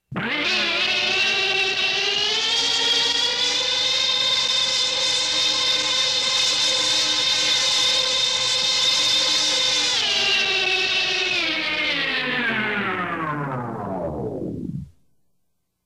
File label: subterranean elevator; turbolift